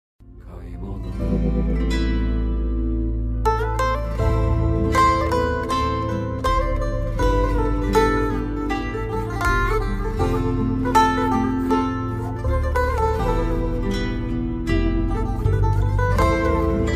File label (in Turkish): Ney Fon Muzigi